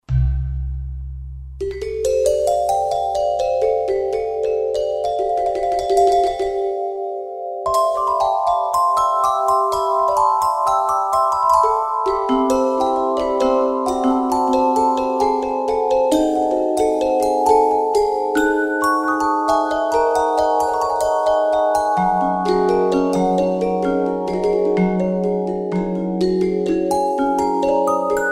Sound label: Gamelan Orch; World; Roland SR-JV80-14 World Collection Asia